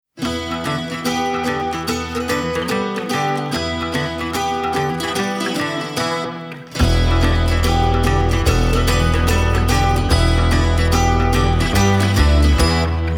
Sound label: acoustic version